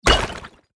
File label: hammer